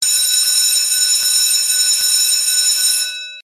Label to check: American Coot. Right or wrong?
Wrong